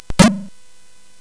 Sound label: Tecnetium, CSG8580R5; hvsc